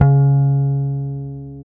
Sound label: Basses, Roland